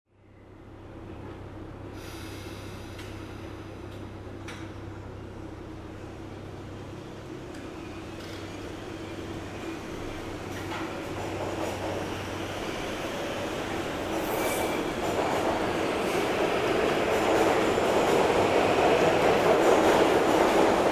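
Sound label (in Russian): метро поезд уезжает со станции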